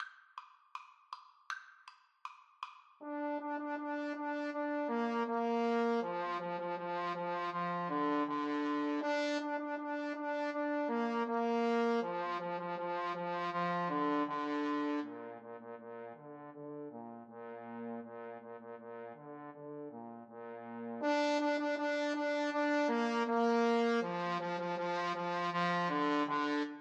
Trombone Duet

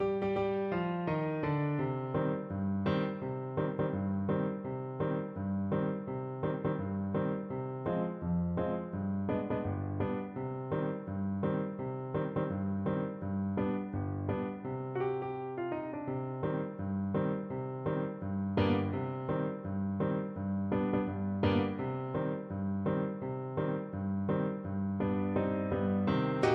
joshua fit the battle, Saxophone

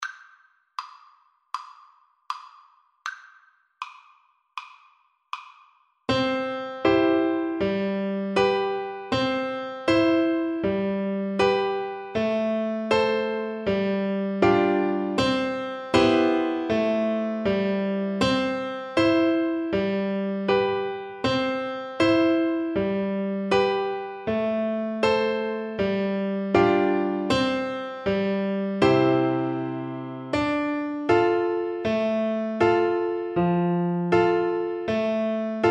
auclair kar, Flute

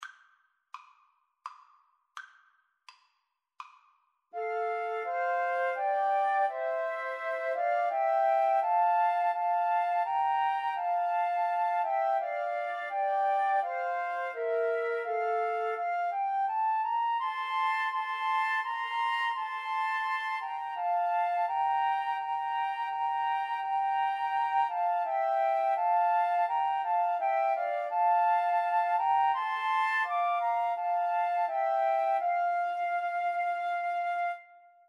my country tis of thee, Flute Trio

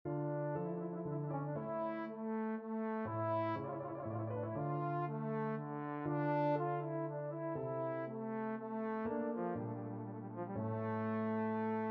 corelli concerto grosso op 6 no 8, trombone

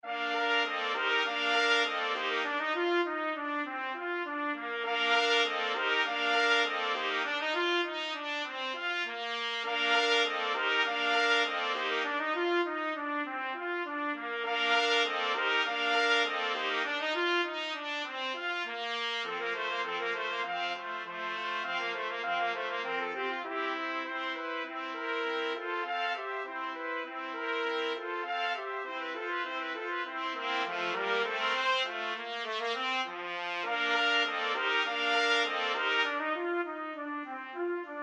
musette 4TPT, trumpet quartet

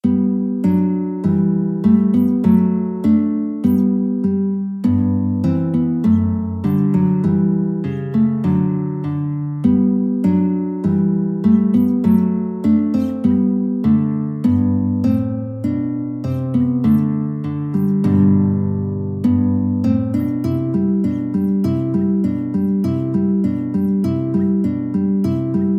gavotte, guitar

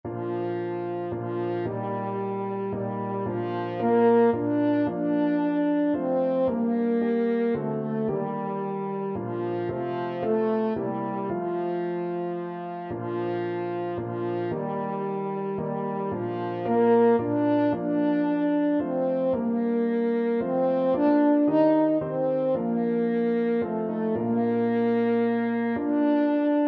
french horn

